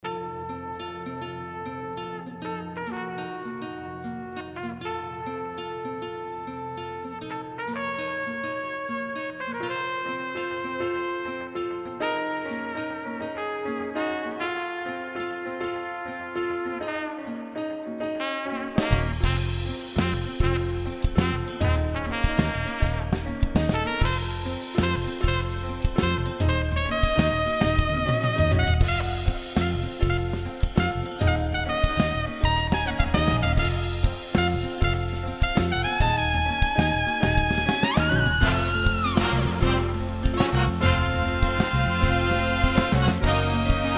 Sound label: Smooth Jazz